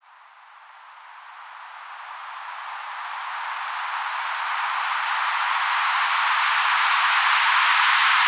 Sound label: Pumping Riser; Effects; Electronisounds